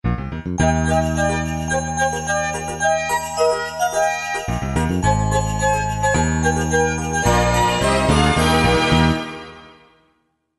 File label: jingle bells